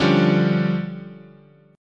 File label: D Minor Major 7th Double Flat 5th, chord